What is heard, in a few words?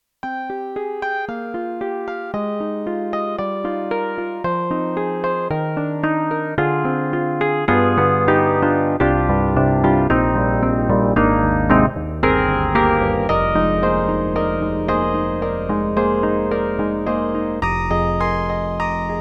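YAMAHA MU1000EX, Nasal DX Detuned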